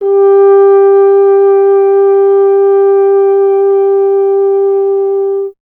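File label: French Horn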